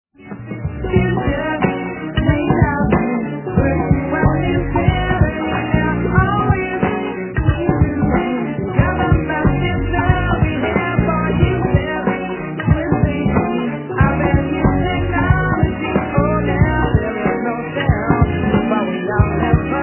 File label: music test